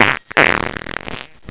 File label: fart